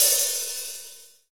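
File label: HH IH, Roland, hi-hat; 90 s Sample CDs; Sound & Vision - Gigapack I CD 1 (Roland); Real-Kit s 6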